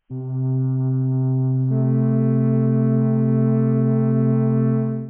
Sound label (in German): flöte c1a1; Intervalle, die man durch Potenzen von 2 und 3 darstellen kann